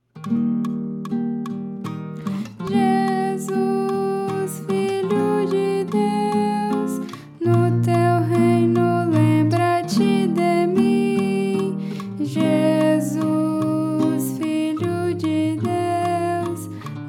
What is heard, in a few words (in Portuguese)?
Soprano